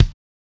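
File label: bass drum, Disco; DRUM SAMPLS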